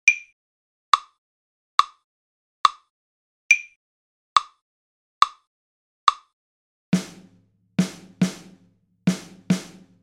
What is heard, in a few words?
Rhythm Dictation